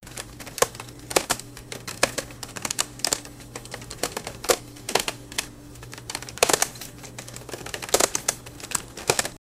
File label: cash register sound